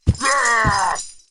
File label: ogre